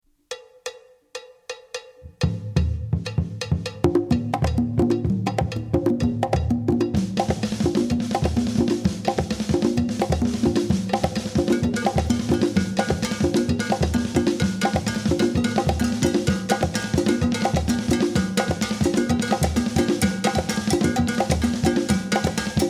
Conga

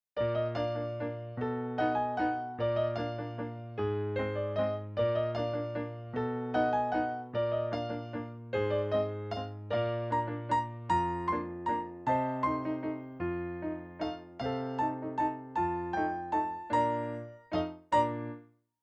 Guitar

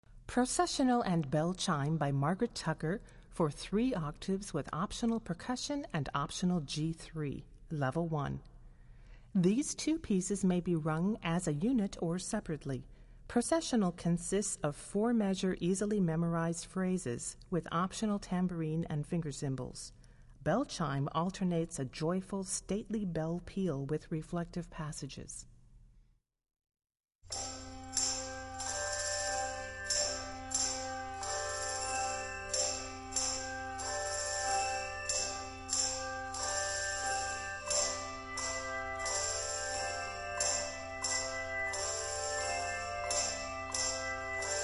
Handbells

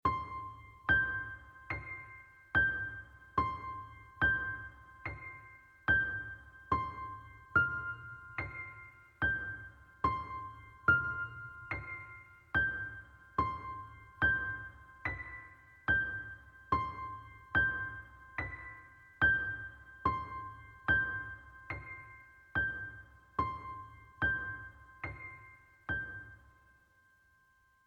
Background Ostinato

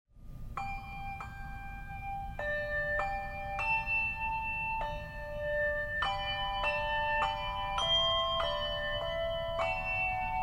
Handbells, Handbell Music; O Come All Ye Faithful; Publisher: Jubilate Music

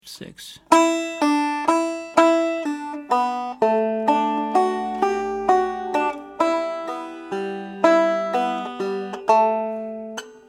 Irish Whiskey, banjo; banjo music books

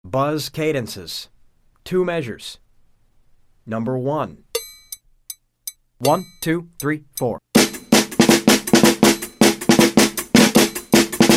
Buzz Cadences; Warner Bros Publications

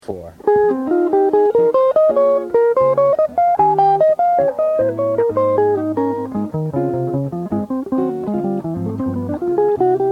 Bebop Swing; CPP Belwin

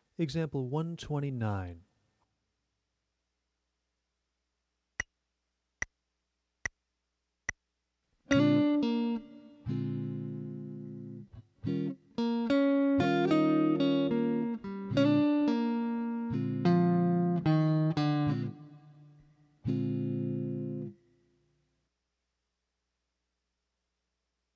Adding Major Pentatoni; alfred publishing

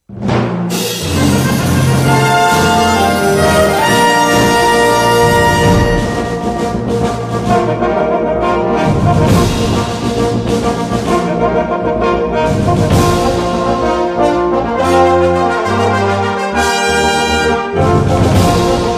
Fanfare

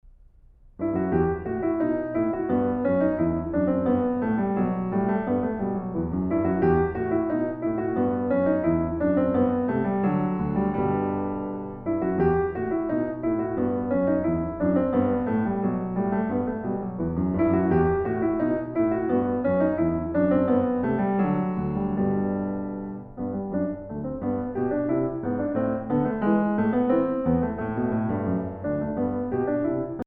Bourree In E Minor, Piano

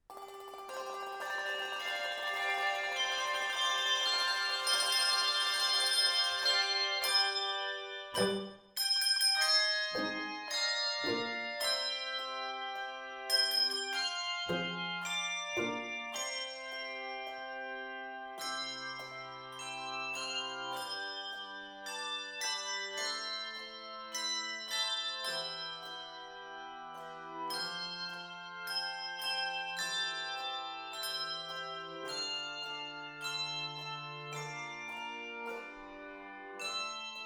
Handbells 3-5 Octave

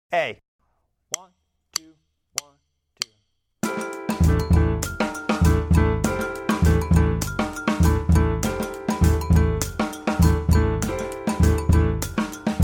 Afoxe Rhythms, percussion; jazz latin percussion

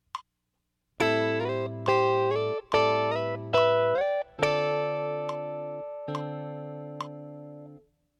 Sample Lick; Alfred Publishing

